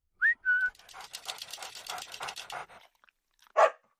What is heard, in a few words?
Working Dog Assist, cani da lavoro